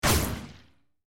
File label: Cross Bomb Destroying; My Cute Commissar